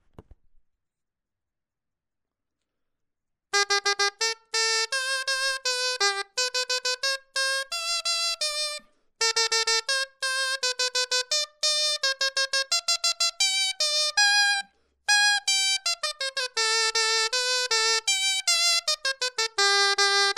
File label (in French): clarin etude